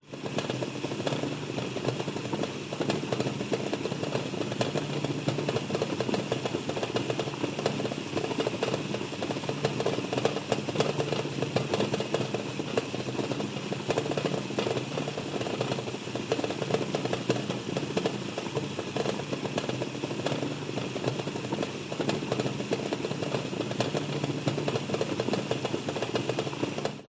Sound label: household water boiling pan lid shake